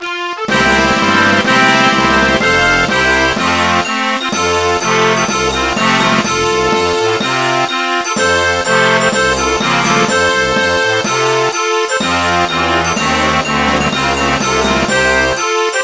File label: Mexico flag anthem